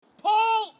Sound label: pull